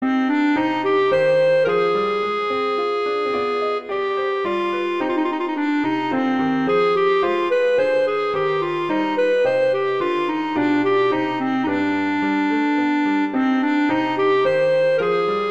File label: Minuet; Bach; Suite 2